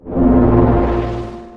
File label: collect; 5element